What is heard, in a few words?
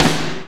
TURBO SN